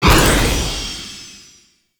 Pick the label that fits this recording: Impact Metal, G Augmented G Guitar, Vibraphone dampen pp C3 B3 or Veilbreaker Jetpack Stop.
Veilbreaker Jetpack Stop